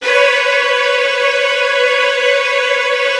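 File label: Virtuoso Strings, violin trills, B2; ILIO; Kirk Hunter Virtuoso Strings VOL-1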